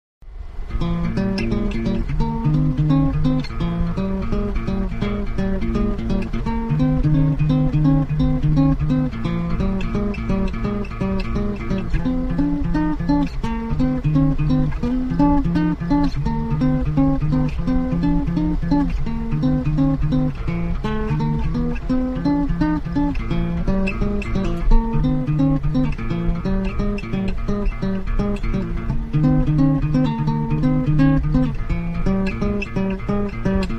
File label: two string blues